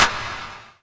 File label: Clap; osu